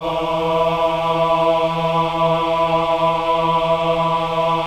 VIB E3, VIBRATO, Voices; Spectrasonics

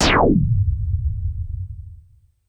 Sci fi

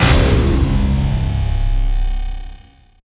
movie, impacts & hits; Techno Trance Essentials

